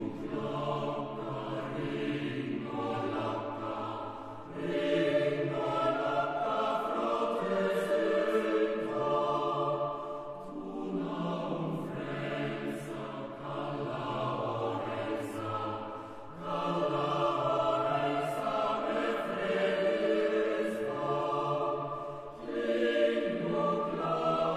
Traditional (Norwegian) Kling no klokka (The bells ring, they sound)